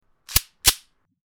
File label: Reloading a gun